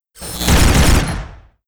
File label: war attack; HOBOMITE